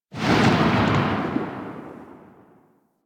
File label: Flame Spear Tail C, Grineer, Weapons